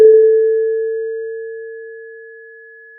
pop, Sound of a church bell

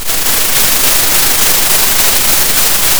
Sound of a thunderstorm

